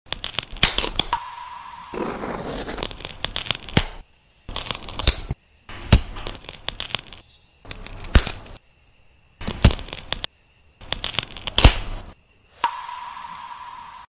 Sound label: Peel